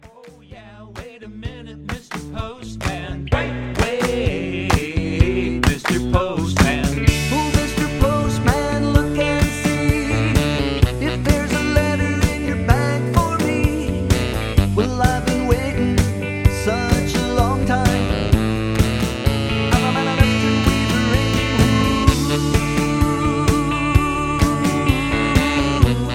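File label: No Melody with backing vocals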